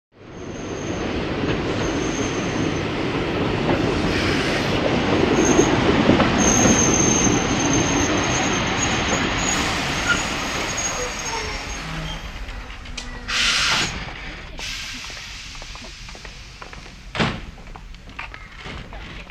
Electric Train Into Station, Doors Open and Close To Let Off Passengers, And Then Pulls Out Of Station.; In / Stop / Away